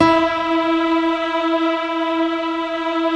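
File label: E 3, PIANO CHOIR; Best Service; Advanced Media Trax Modern Composer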